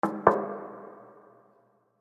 Semi Truck Gas Tanker Impact Tap Tank Double Light; Trucks; Blastwave